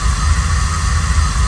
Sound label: Pipe